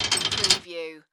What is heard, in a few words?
Chain Metal Clanging